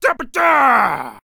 Knight Attack Vocals

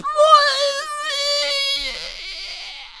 Sensei Death Vocals

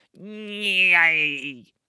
Ice Mage Attack Vocals

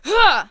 Bomb Thrower Attack Vocals

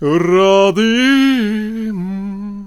Attack Vocals; Healer

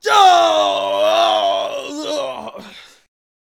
Super Boxer Death Vocals

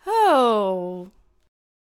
Death Vocals

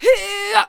Bomb Thrower Attack Vocals

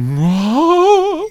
Attack Vocals

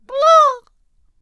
Halfling Death Vocals

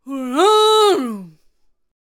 Paladin Attack Vocals

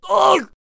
Squire Death Vocals

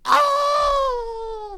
Death Vocals; Snake Archer

